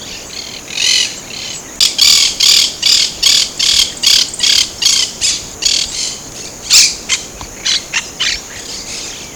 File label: Paraqueet